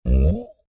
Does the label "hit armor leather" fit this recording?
No